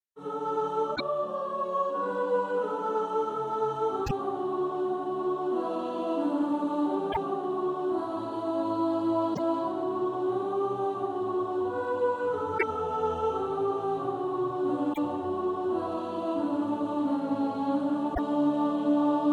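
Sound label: soprano; How sweet the name Jesus sounds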